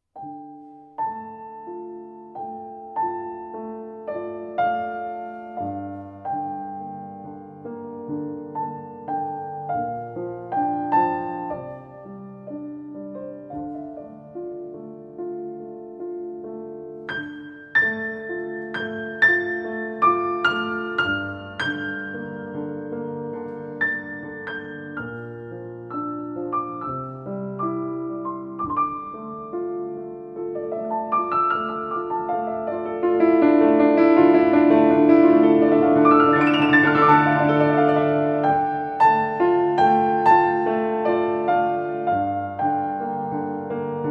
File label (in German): Meditative Klavierklaenge